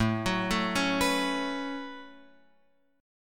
A 6th Add 9th Guitar Open F, chord; A6add9; arpeggiated

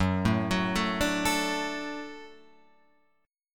Gb Minor Sharp 5th Gb down Guitar Open F; Gbm#5; arpeggiated; JGuitar

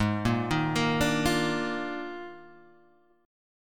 Eb Major 7th Suspended 2nd Suspended 4th

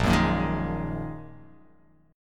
A sharp 7th Suspended 4th Sharp 5th down

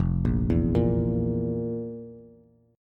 F Major 7th Suspended 4th F, Bass; FM7sus4 arpeggiated; JGuitar

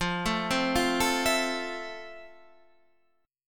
F Major 7th F

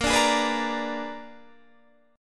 A sharp 7th Flat 9th, Guitar Open D chord

